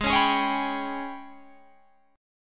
G sharp Minor Major 7th Double Flat 5th, Guitar Open G